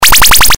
powerup; Asteroids-Reloaded

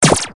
generate award; fctank

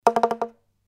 level start; petparty

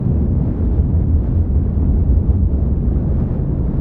thrusters Loop; AsteroidPatrol3D

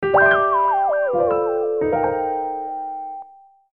gameover; snap

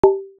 music high; Asteroid-js

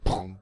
collide

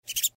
monster; petparty

